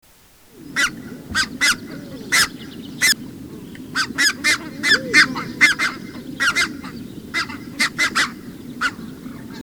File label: red breasted goose